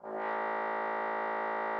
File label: trombone A1; Xsample VOL-3